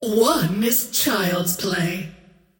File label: scream; queenofpain